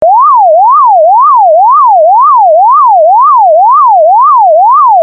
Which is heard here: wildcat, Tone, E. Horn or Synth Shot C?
Tone